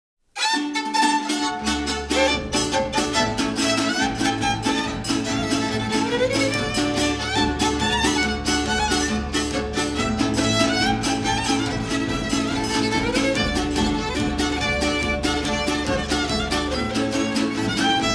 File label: Polka; Szumi gajen